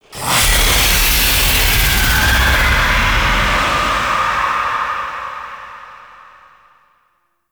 End Shriek; Narmer Outpost End; Nira

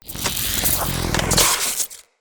Aoe Shockwave Charge Up

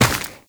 Run Dirt; Footsteps; Warframe; Tenno

